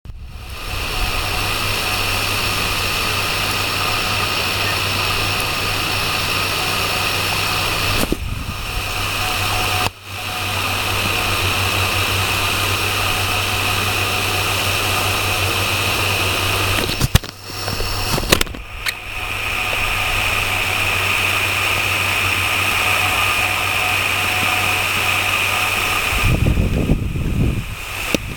RX Home made

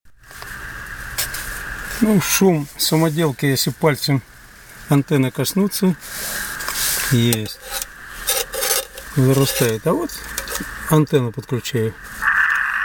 Shum Home made

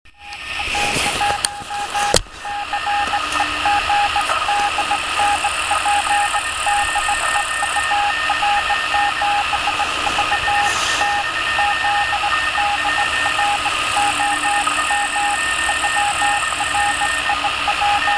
RX

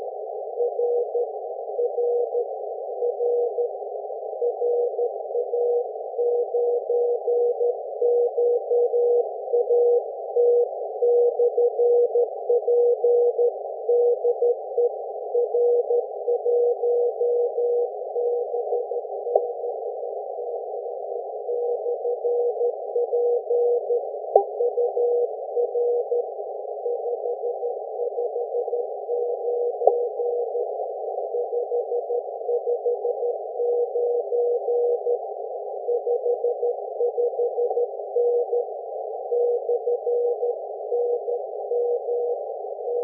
14MHz; 1.5 Wtts

